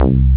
dig dug

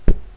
BOUNCE; trueSpace 7.6

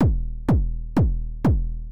kick, house, 125, drum; eJay

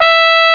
trumpet

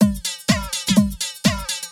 drum loop house 125; eJay

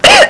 COUGH

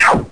cursor

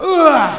play ow

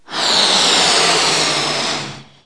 Blow; KABOOM! - Wild and Wacky Sound Software; outdoors; balloons

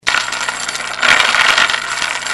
CHAIN DR, TOOLS

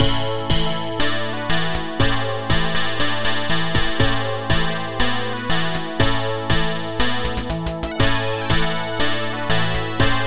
bluesy; Multimedia Toolkit

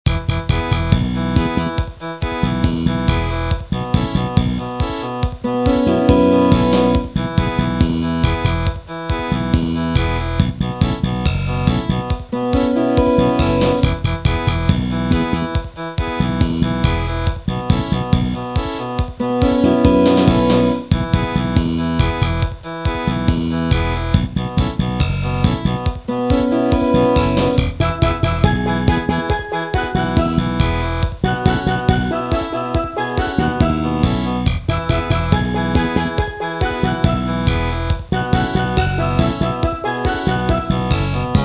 Reggae

